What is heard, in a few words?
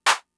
Clap; Fricke MFB-522